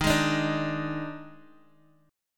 D sharp Major 7th Suspended 4th, D sharp, down, Guitar, DADGAD